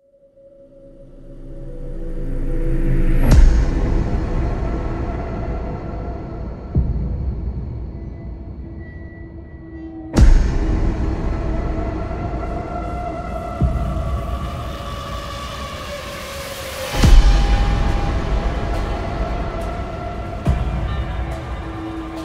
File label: trailer music epic intense horror